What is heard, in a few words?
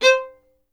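STR violin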